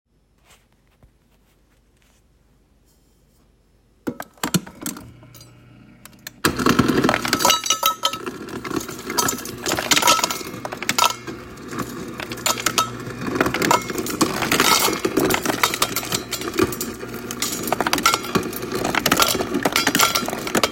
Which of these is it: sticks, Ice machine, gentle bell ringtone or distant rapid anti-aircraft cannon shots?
Ice machine